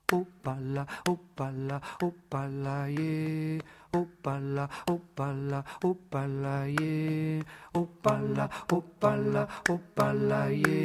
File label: canto